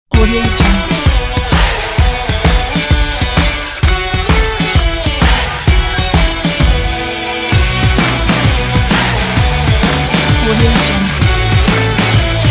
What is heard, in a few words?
pop rock